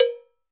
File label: Cowbell E, Latin Percussion; Drums; Drum Hits; Ableton; Factory Packs